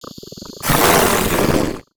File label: imp sight, monsters; Doom